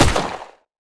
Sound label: colt45, guns